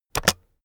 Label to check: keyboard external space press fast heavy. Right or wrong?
Right